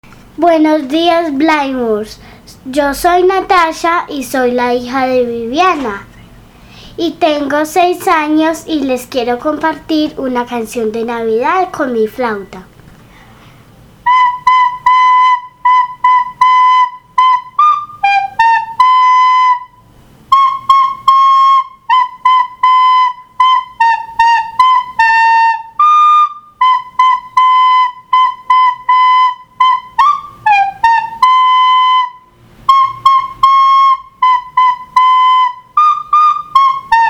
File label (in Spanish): flauta